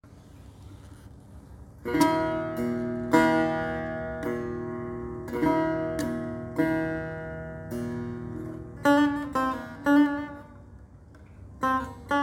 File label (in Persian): نمونه صدای جدید سه تار; با-مهر-امید